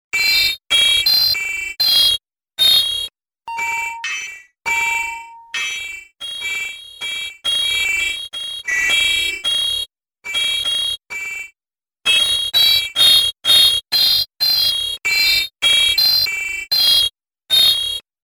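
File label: metallic tones sequence sword singing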